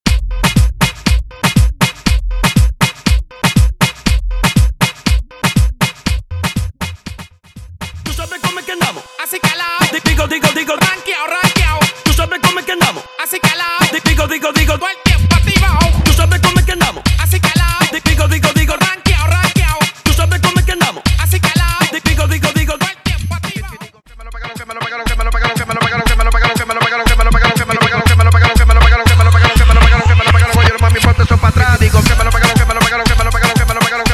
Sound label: intro outro 120 BPM